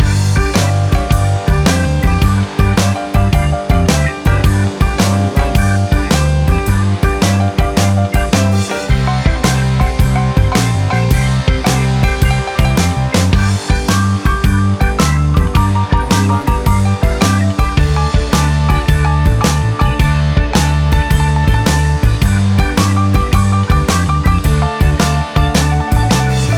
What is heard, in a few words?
Bluesy Country Rocking Music